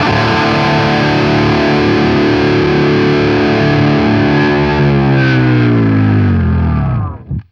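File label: power D cut, guitar power chord; East West; Steve Stevens Guitar Samples Collection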